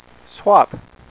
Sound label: SWAP